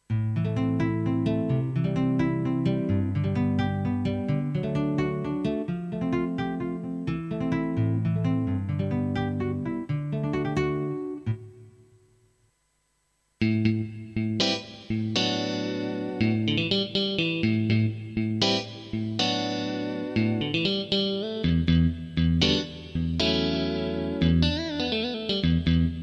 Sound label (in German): solo gitarren